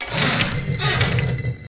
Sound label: onager moving; attack; siege; 0AD